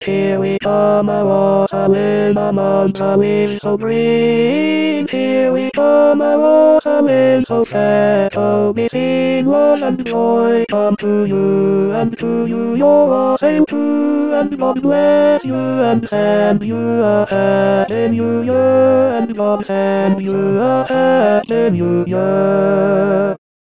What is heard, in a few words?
TENOR; Here We Come a wassailing